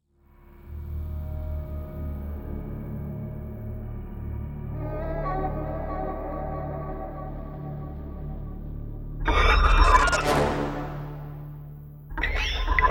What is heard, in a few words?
(No Drums)